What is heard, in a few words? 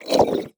Multimedia Internet CD-Rom Flash Animation